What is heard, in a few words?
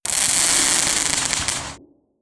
cracks